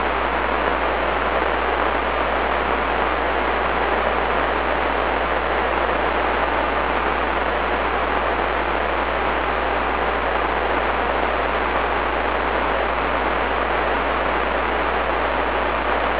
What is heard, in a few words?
satcom 250.350 nfm